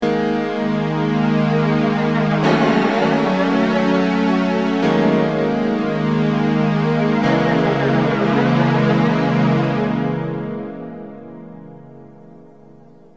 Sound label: m1000 sbf325